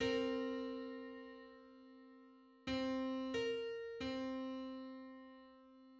Nine hundred third harmonic on C

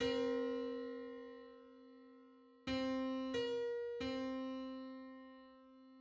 Four-hundred-sixty-fifth harmonic on C

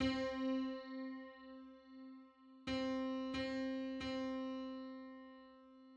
Septimal semicomma on C

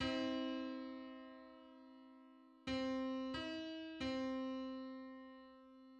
Two hundred ninth subharmonic on C

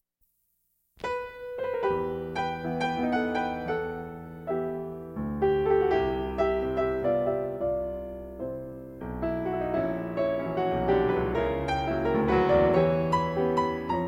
waltz; Brahms

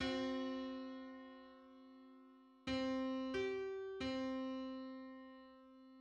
Twenty-third subharmonic on C

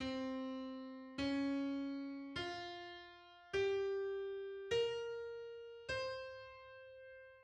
Insen scale on C

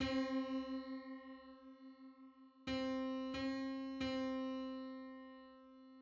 Septimal diesis on C; 35.69 cents

